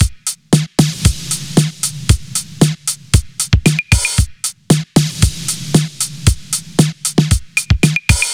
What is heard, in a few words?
Beat Rocker; Zero-G; Future Wave; Beats